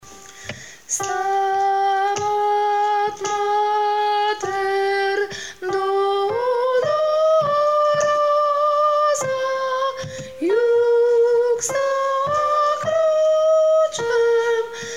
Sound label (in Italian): Stabat Mater soprani; Kodaly